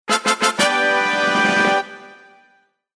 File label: victory, jingle